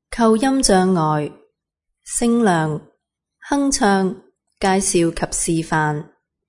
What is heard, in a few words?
humming intro; aphasia